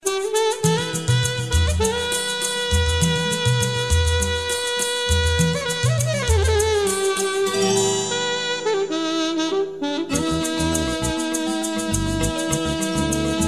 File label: Percussion